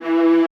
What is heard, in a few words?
QVIB F E2, Orchestra; AKAI Advance Orchestra Upgrade 97 Vol.1